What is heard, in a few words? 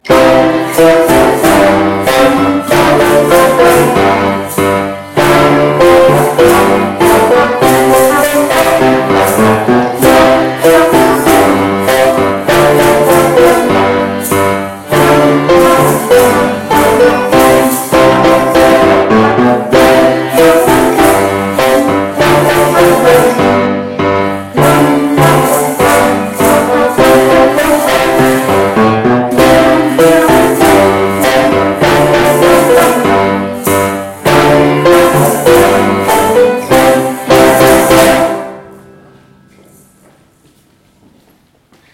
orchestra cha cha